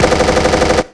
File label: Gun